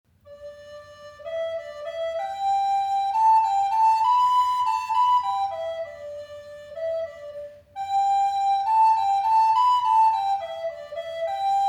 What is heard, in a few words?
Fipple Penta